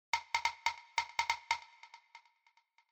Percussion, 142 BPM

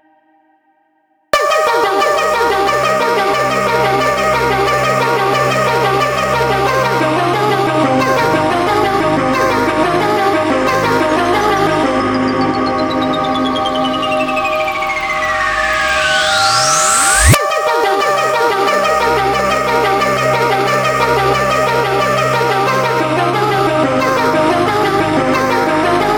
(Not instrumental)

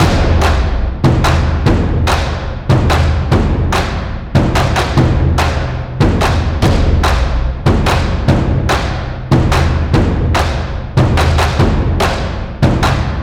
taiko drums, 145BPM

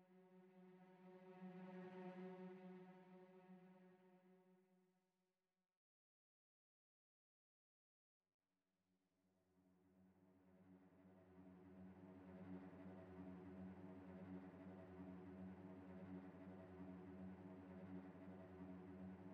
str cellos, 87BPM

